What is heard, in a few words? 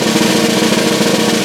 Snare Roll; Digital Sound Factory Virtuoso